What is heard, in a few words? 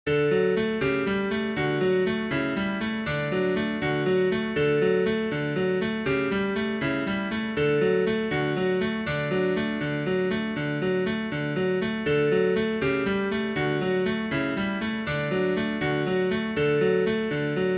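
Allegro, solo piano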